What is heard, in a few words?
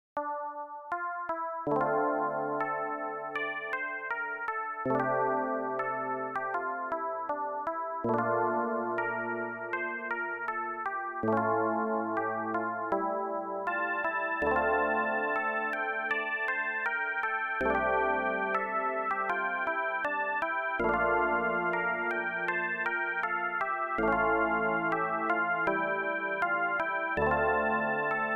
BAROQUE